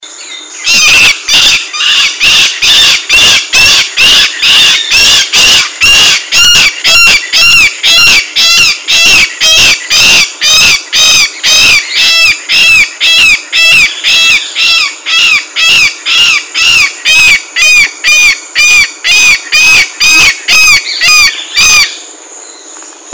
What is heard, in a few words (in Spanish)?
chimachima